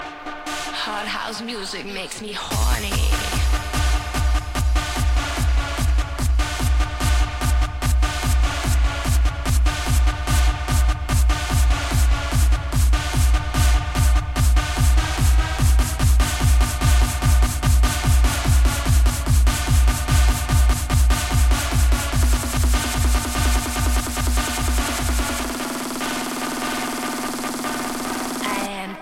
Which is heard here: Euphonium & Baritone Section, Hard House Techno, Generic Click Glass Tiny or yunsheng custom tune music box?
Hard House Techno